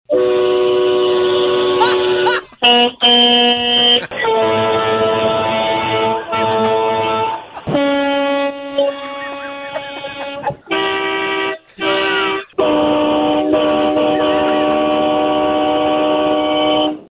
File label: Horn Montage; KSFO; november 29 the ksfo morning show